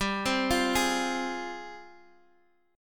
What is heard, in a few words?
F Minor G, Fm/G chord; arpeggiated; JGuitar